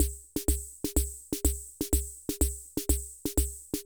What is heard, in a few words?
Loop, classic machines; arranger